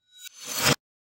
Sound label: Reverse Glass Portal; SoundsCrate